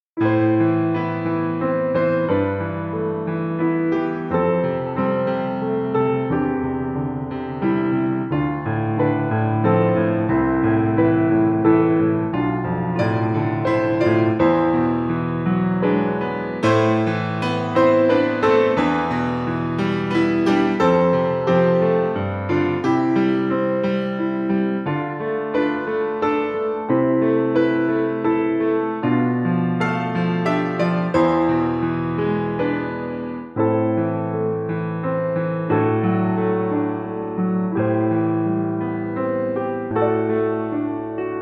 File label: Steinway D